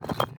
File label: building barrier destroy